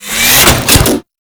Drone Latch On; Warframe

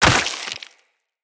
Short Sword On Killed; Weapons; Player

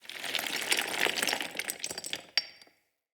Amb Ruin Stone Crumble; Ambience; Mendicant Area

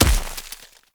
Snow Ball Impact; Warframe

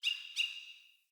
Bird Double Chirp; Josalfar Bird

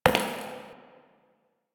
idle single step; Tagfer

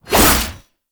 Naginata Whoosh, Weapons Melee; Warframe; Koumei Naginata

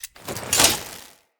Casting Device Un Equip; Weapons; Player

